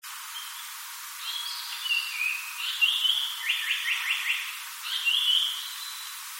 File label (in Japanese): sanko